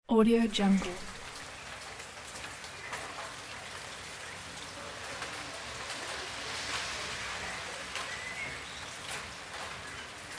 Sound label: small rain